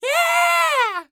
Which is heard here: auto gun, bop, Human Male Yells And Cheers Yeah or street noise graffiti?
Human Male Yells And Cheers Yeah